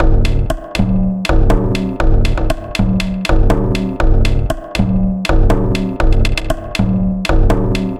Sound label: Frame Drum Loop, Ethnic Instruments; Sonic Foundry (Sony Creative Software); The Electronic Music Manuscript Collection